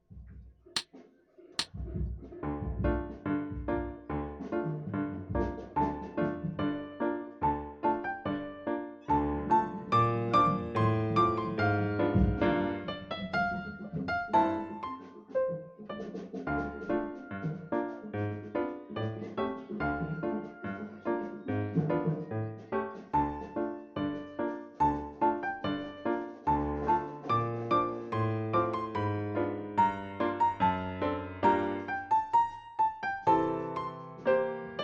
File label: Bergamask-Slow Tempo with Solo, Flute; Koepke